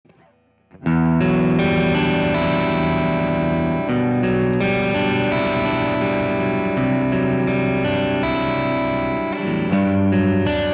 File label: Low Gain Neck Voice 2, Schecter SLS Elite PT